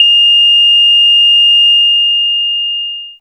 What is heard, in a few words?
flat beat; gamerip; shattered horizon 2009